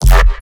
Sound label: bass hit strong burst F; Virtual Riot